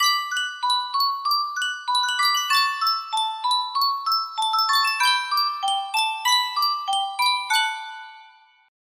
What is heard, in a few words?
sankyo miniature music box